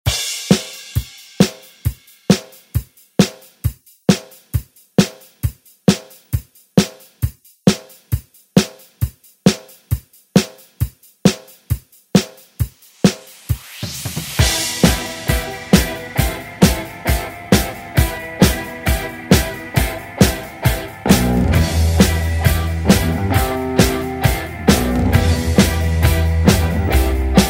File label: 70s ReDrum; DJ Mhark